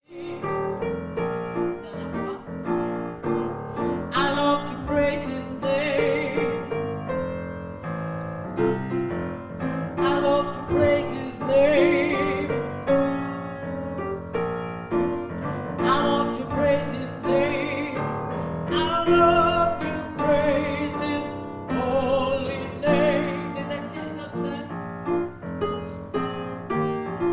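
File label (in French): alto homme, gospel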